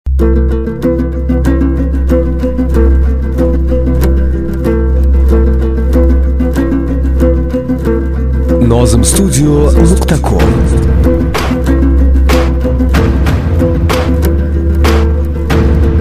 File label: Music